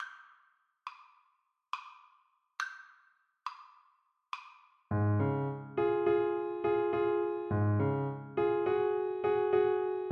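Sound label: give us a drink alto saxophone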